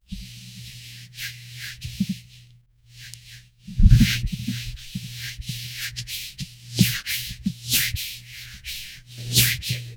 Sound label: no talking asmr spa massa